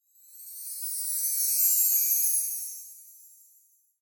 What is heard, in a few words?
firefly